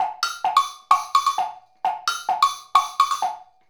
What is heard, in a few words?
Wood Block; Sonic Foundry (Sony Creative Software) - Essential Percussion